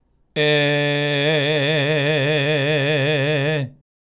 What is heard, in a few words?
vibrato G e fast; ビブラート